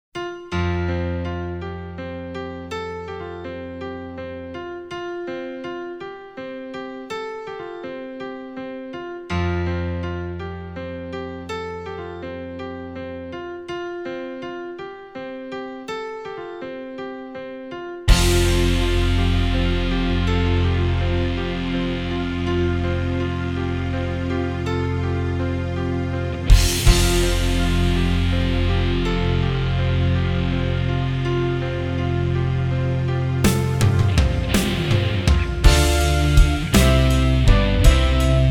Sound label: No Lead Key Of F